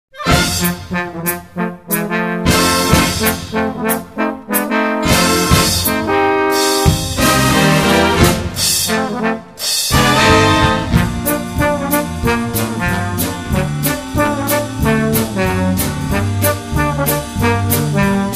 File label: jazz